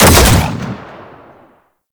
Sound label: Fire 3D, Weapons; Warframe; Seer